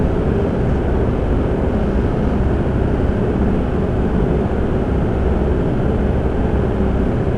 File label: Airplane propel; HeneGames; Simple Airplane Controller